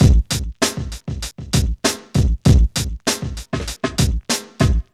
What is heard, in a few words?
SLAM JAM 98, Funky Elements LOOPS; Zero-G